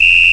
Out of bounds; Frog Xing 1.1

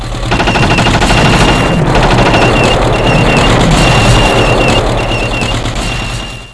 ELEPHANT; civ2; wwii

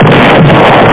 EXPL; Amiga Games 1996 #7; warstar

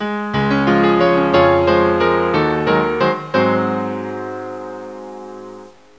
waltz (piano); mikolaj; toymp

